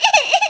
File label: Cartoon, Cry - Female - Crying - Sobbing, Human